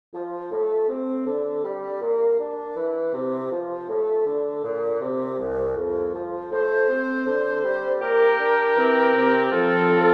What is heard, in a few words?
major for woodwind quintet; bassani giovanni battista; credo unum deum